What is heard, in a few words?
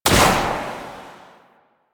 Shot, Pistol; Warframe; Weapons; Tenno; Priest; Knell Prime